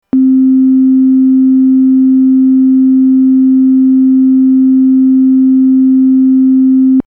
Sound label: sine, MIDI Synth